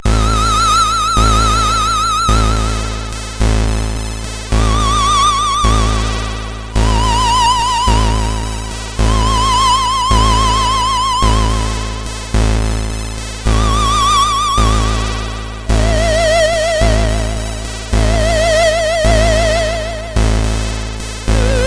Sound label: sid CSG8580R5; Moonshadow; hvsc